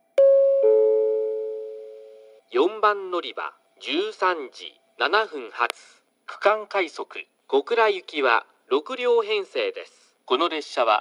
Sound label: tosu regional rapid kokura; 4番のりば案内放送（区間快速 小倉行き）; 鳥栖; 博多〜福間間快速